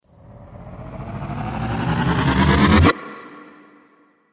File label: Dance Riser; Rise And Dive Sound Effects; sound design